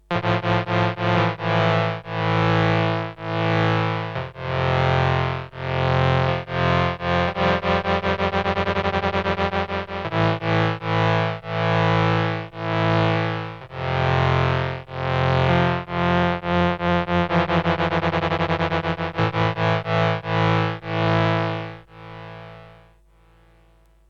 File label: Radius Synth Tremolo; Red Panda